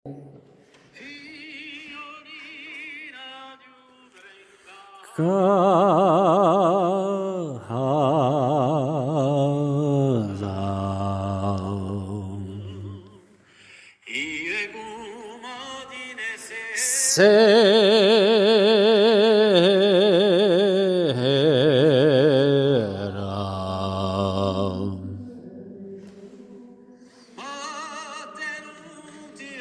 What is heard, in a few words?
BASSES